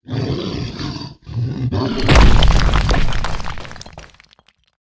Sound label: bug shark gate death, monster